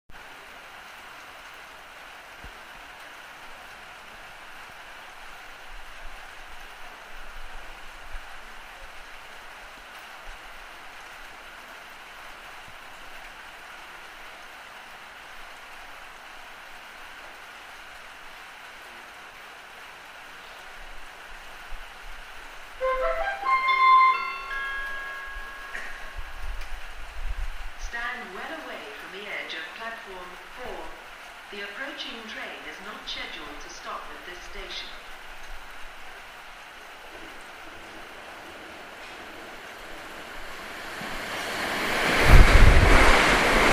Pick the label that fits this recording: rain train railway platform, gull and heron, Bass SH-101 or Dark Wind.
rain train railway platform